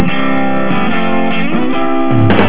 fire

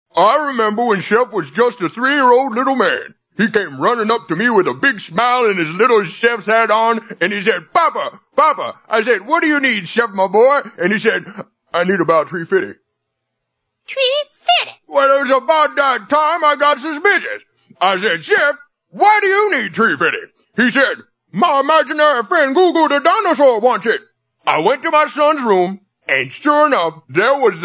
303 dinosaur

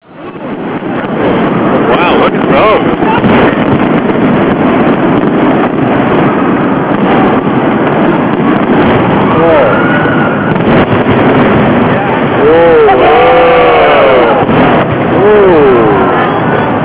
boom

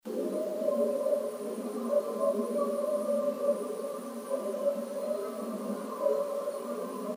owl

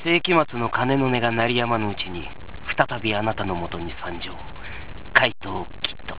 kid

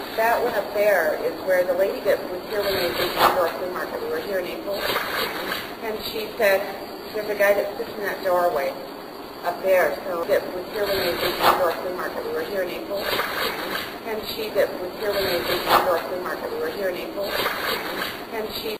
Bad Shush

